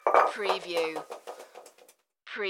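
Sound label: Weight Drop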